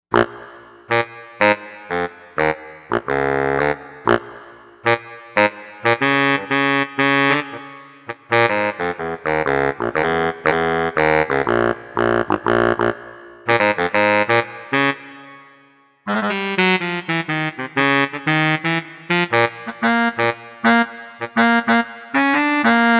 Baritonsax, Korg M1Rex; Factory Patches

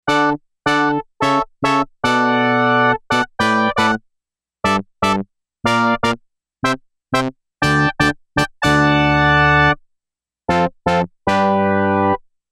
Brass 1, Yamaha DX11; Factory Preset Voices

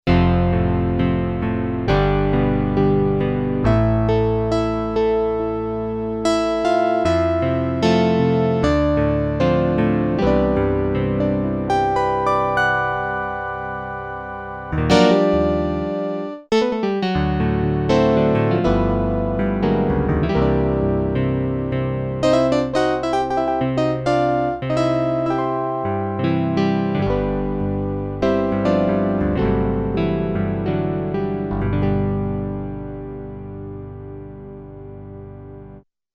acoustic piano, Korg DW-8000; Factory Patches

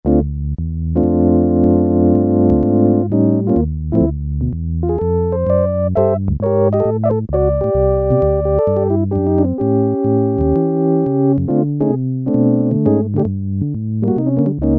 Organ, Korg PolySix; Factory Presets Bank A

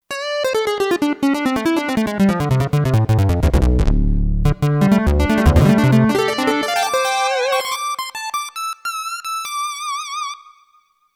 Mini Lead Poly, Kurzweil K2500X; Factory Programs

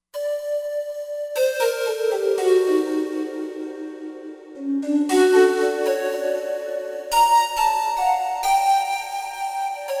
Himalaya Pipe

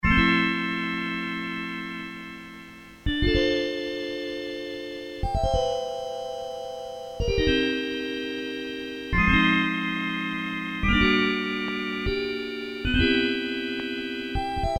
vibraphone, Casio SK-1; Harmonic component sound

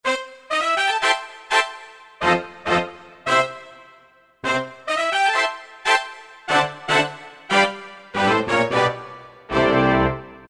X Brass; Factory Combi demos

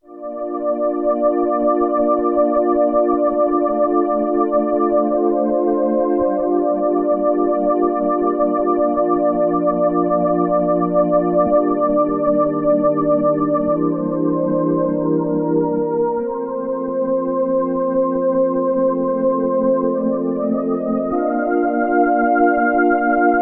Choir, Roland JX-8P

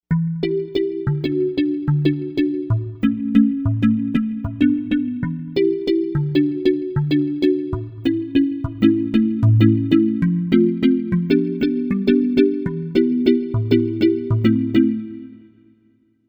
Mallet; Novation UltraNova; Factory Patches

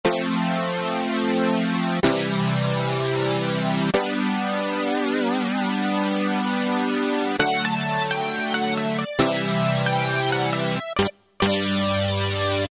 syn JP Six, E-mu PK-6

